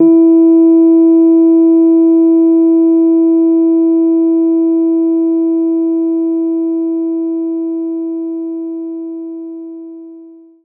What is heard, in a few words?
Rhodes E3; knights try